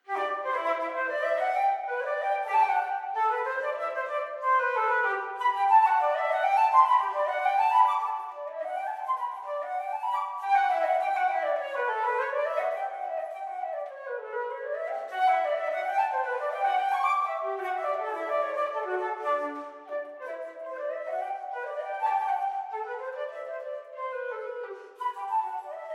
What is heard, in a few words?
Bourree; Partita no. 3 in E Major BWV1006; JS Bach